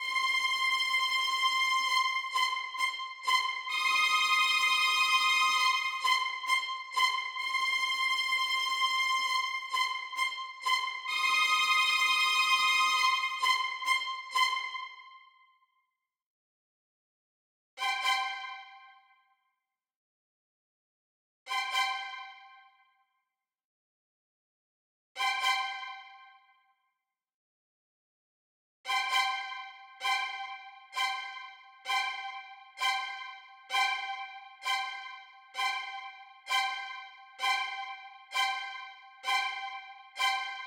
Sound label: Orchestra Violin; McRoMusic; Summoning Circle